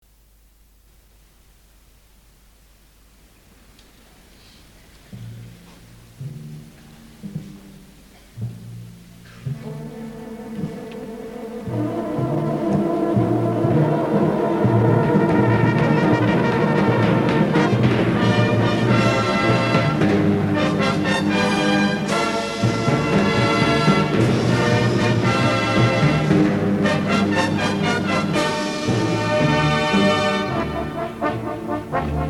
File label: tape raw